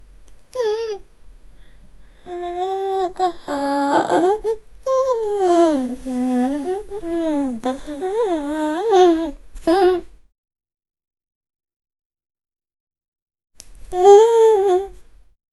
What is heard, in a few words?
funny awkward silly situa, awkward